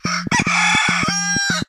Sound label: Alarm Rooster; Motorola; Edge 70 Max China; alarms